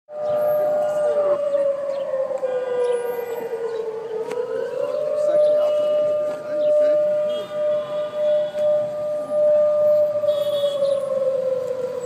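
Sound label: south korea emergency alert system alarm real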